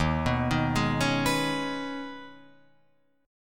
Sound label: Eb 7th Suspended 4th Sharp 5th Guitar Open F, Eb7sus4#5 chord; arpeggiated; 3,6,3,3,4,6; JGuitar